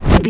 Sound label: casting charge whoosh buildup